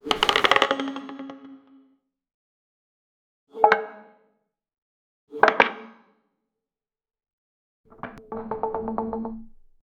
clean puzzle line clear s, puzzle

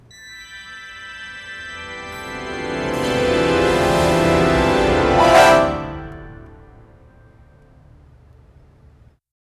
final orchestral rise; waves

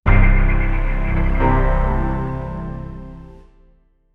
operation theater tones, hospital

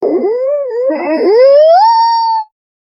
cartoon mouses oooh; human vocal

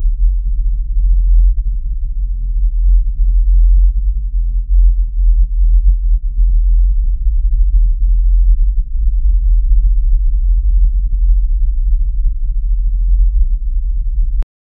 the sound of low frequenc, aircraft

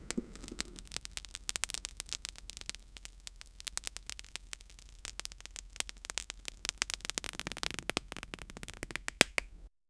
a crisp and satisfying, fireworks

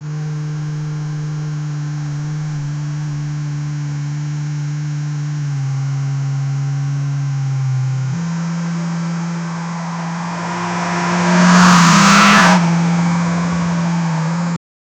car motor for car game; automobiles

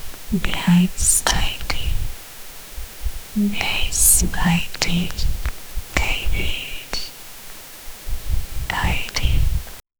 femele mystical meditativ, diffuse

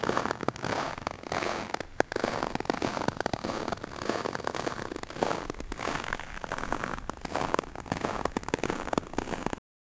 crunching snow sound

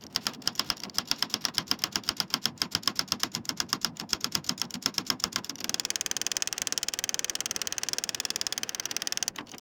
create fast paced rhythmi, woodworking

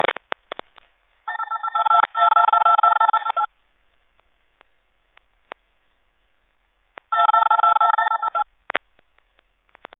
a short voicemail crackle, telephone

